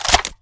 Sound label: saw cover down, m249